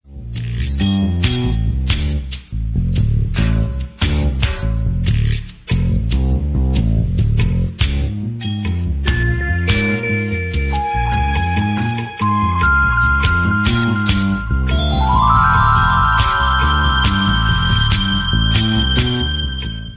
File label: drum solo